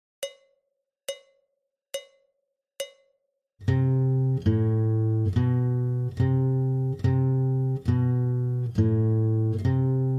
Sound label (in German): Warm Up C tief, Gitarre